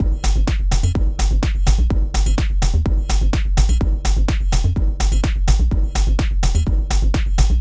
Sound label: Backing; Reaktor blocks